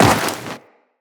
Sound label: Walk Snow, Footsteps; Tenno